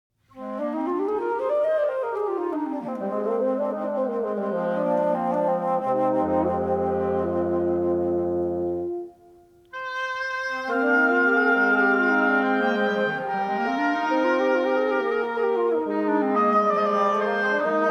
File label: the nature of woodwind